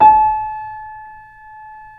C7 PP, The Ultimate Piano Collection; East West

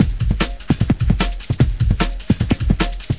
processed acoustic drum kit; FRACTAL INFUSION KIT

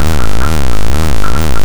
FX LOOP 7, Big Beat Samples; Big Beat Samples & More; AKAI; FX LOOPS KIT

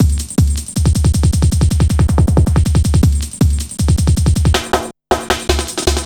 Drum Loops, 158.5; Jungle

